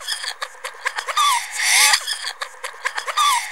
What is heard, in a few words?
FX VO, CROWD FX; E-Lab; X Static Goldmine 2; akai